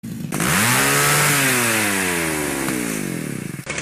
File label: Chain saw; Halloween; fetes